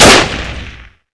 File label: 30cal